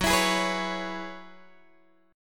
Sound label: Gb 7th Suspended 2nd Suspended 4th, Guitar, Open G